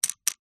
dominos stack on top of each other

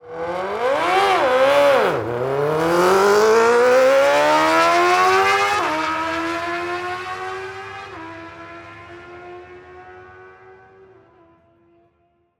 Motorcycle Drag; Blastwave FX

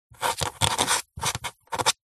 office quill pen writing siganture

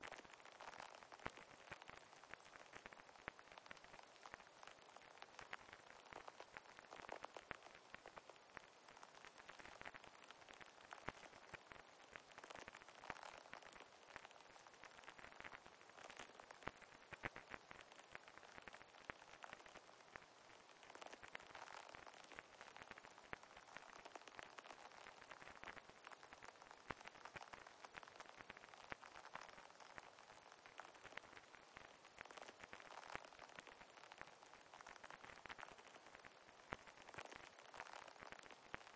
rain very light; PMSFX

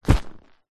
foley leather handbag or pouch set down carpet

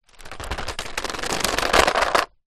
car hubcap plastic roll then land in road; vehicle

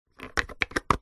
household toilet bleach plastic cap lid screw on; zapsplat

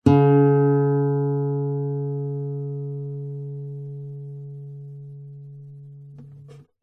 musical guitar nylon mid string pick stop finger; zapsplat

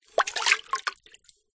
household water in large metal pot movement; zapsplat

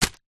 household wet wipes packet drop on wood; zapsplat

